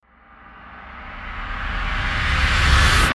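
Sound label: Trailer Hit Reverse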